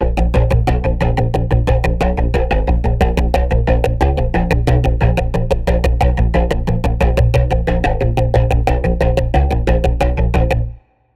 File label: Pulse